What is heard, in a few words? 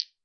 ultimate jaw harp; WORLD